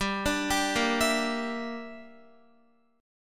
G Minor 6th, Guitar; Gm6 arpeggiated; x,10,12,12,10,11

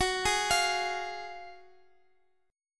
Gb Major 7th Suspended 2nd, Guitar Open F; GbM7sus2 arpeggiated; JGuitar

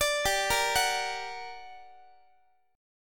G Minor Major 7th, down, Guitar; GmM7 arpeggiated; x,x,17,17,14,17; JGuitar

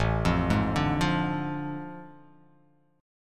A Major 7th Suspended 4th Sharp 5th

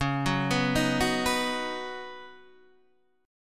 Db 9th Suspended 4th Db Guitar Open D

